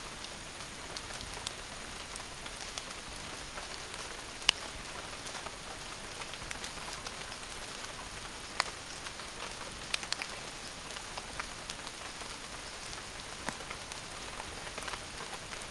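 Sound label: bolivia amazonia cobija jungle ambience exterior; sonniss gdc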